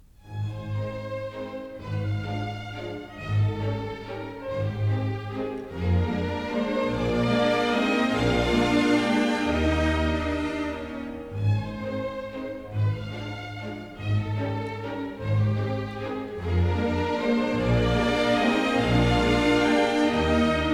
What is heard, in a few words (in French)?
Valse allemande; Schumann; Carnaval